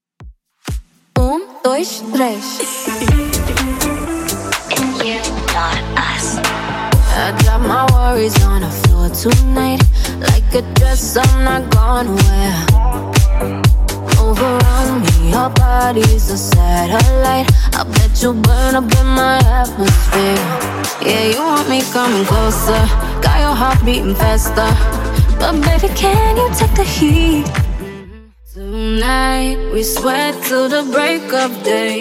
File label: Samba Bootleg House Redrum 125 bpm